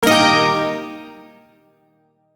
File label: Shawzin Pent Min Chord H; Warframe; Duviri